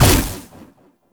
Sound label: Shield Stagger; Warframe; Warframes; Garuda